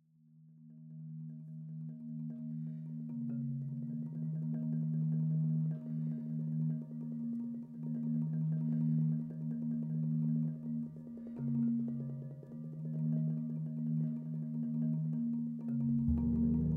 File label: Drum and Harp; Drum and Harp Mood 2